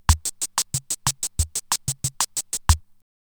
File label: BOSSANOVA, Eminent-Solina Rhitmix drum machine; 300 Drum Machines